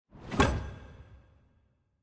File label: promotion arena wood